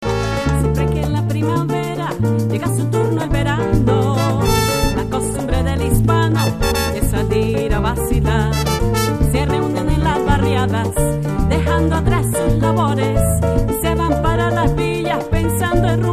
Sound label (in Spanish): La Gran Salsa